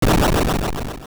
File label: Dungeon Open; A dungeon's main entrance opening